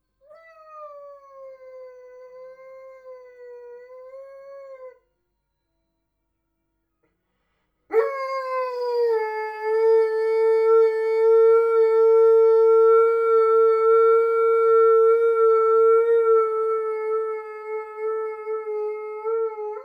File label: howl; Coronation